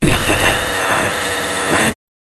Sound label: jingle